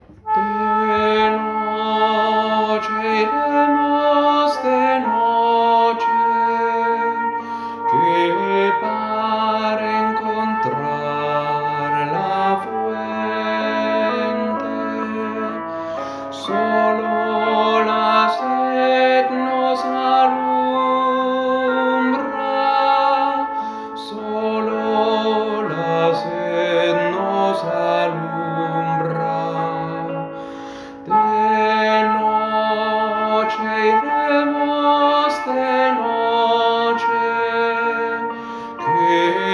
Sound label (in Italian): TENORI; Veglia di preghiera - Santa Cecilia 2023; coro